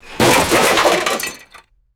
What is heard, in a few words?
heavy metallic microwave crash with debris